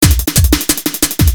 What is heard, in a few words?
Loop